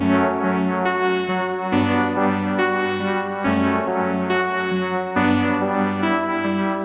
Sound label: 140 BPM F# Min Prophet 6 Keys; Cymatics; Analog; Jupiter; OB6+P6